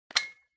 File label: Outlet Timer Unplug; タイマー機器